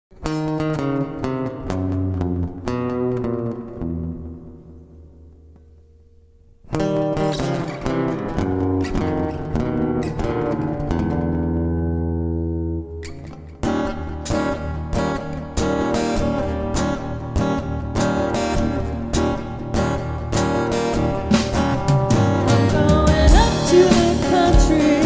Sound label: blues